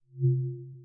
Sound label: hover